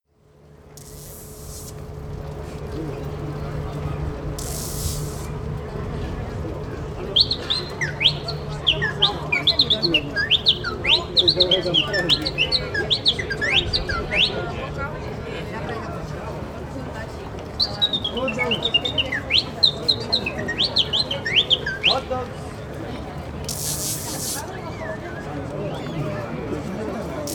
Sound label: birdwhistle seller by the tate; son clair